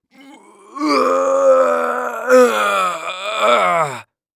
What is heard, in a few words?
Human Male Strain Grunting Long